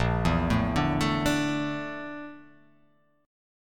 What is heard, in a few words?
Bb Major 7th Flat 5th Bb; BbM7b5 arpeggiated; JGuitar